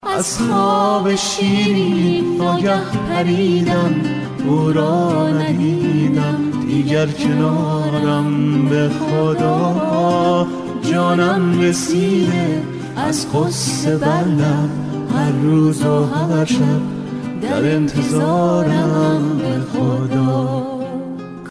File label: Persian Song